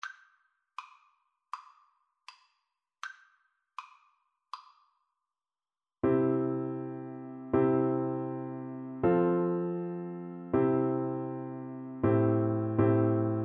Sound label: sally gardens, Alto Recorder